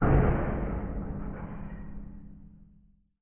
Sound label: door doom; amb; angband